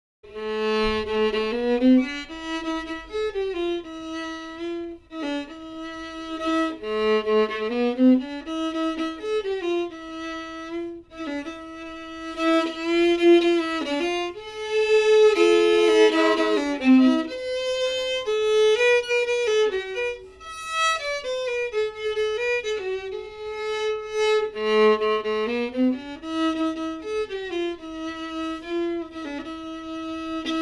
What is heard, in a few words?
Waltz Fiddle